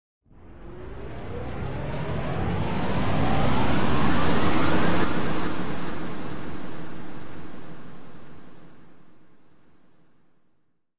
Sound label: transformation; undertale